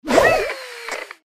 atk sfx

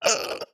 strider hurt; Minecraft

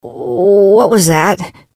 hurt vo; gus

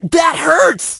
hurt

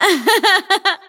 laugh; Irelia

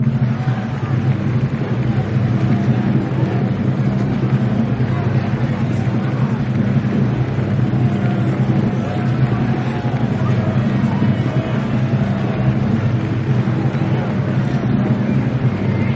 scroll preresult loop

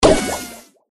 atk hit; gus

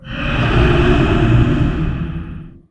Strange Noise; 怪异的噪声; 魔兽争霸3; 亡灵

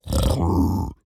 piglin brute idle; 猪灵蛮兵（我的世界）; 猪灵蛮兵是守卫堡垒遗迹的攻击型猪灵变种。; 猪灵蛮兵：愤怒地

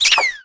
dry fire

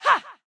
basic attack; Akali

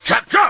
woodsman atk

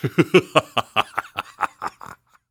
laugh; 傲之追猎者

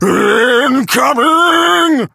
hurt vo

